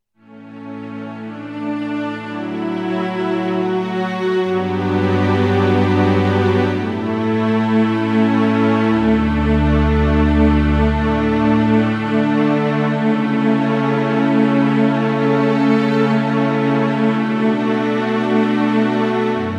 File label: Roland HP702 Rich Strings